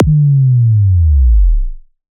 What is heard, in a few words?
Kick Drop, Sub Drops & Boom FXs